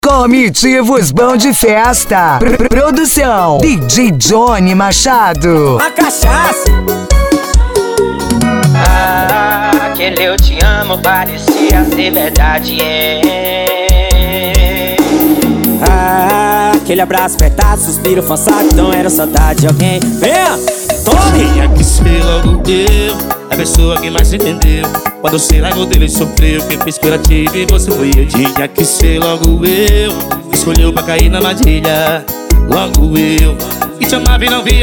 forro e brega; vazante mg

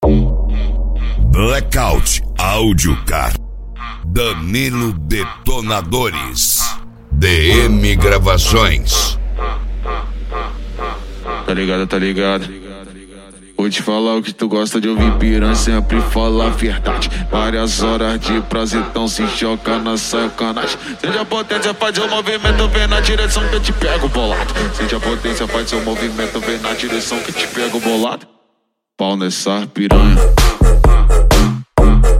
funk house remix

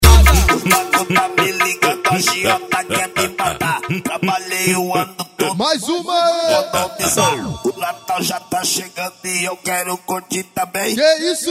funk ritmado; especial cuiaba

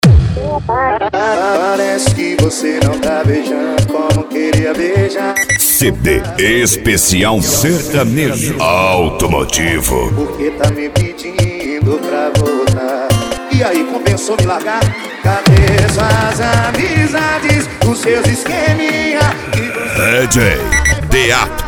sertanejo automotivo

